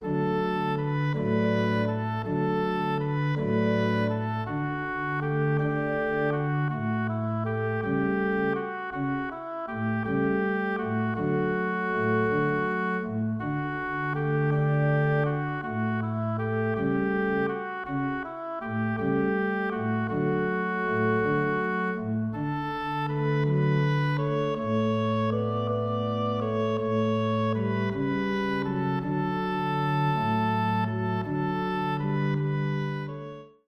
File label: Barcarolle